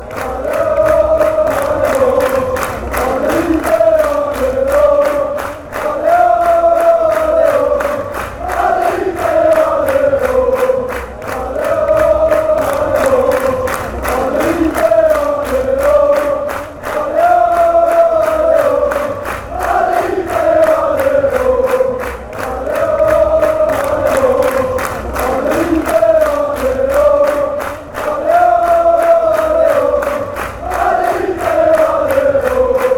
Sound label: allez oh, chants